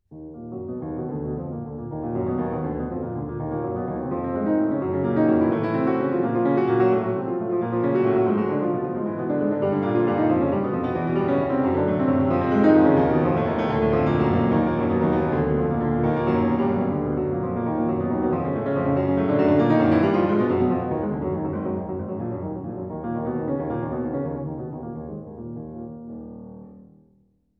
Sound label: 24 preludes e flat minor allegro